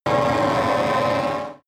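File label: extrinsic drone distorted, energy; energie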